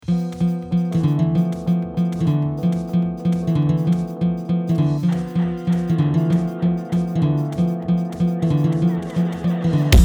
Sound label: Game song